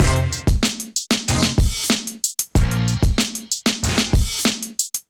Alley Kat Kit, Drums; Ableton; Factory Packs; Chop and Swing

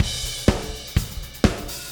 125 Disco Drums; Pop Rock

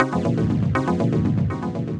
Molecular Arp, Synth Rhythmic; Ableton; Factory Packs; Punch and Tilt

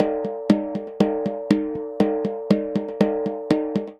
64 Pad Finger Snare; Drum Kits; Ableton; Drum Booth

